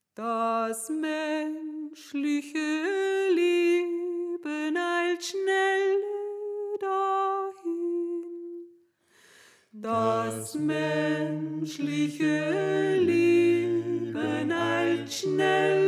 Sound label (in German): Sopran; Das Menschliche Leben Eilt Schnelle